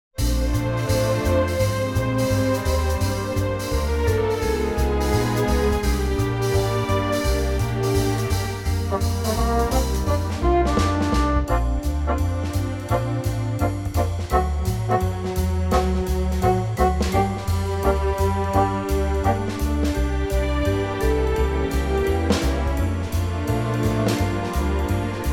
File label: Bb orchestral; key - Bb - vocal range - F to Bb